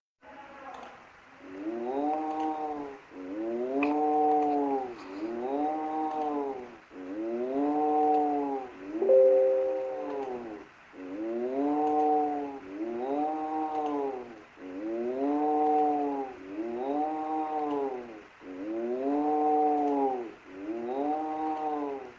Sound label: woooooo